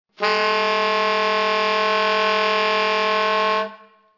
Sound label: multi, sax